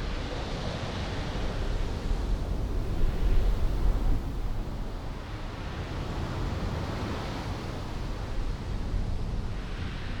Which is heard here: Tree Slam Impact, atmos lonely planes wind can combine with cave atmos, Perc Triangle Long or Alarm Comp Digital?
atmos lonely planes wind can combine with cave atmos